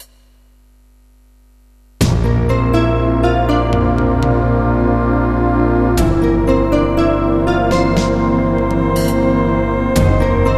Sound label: Midikeyboard; Hjemmelavet Fra Gamle Kasettebaand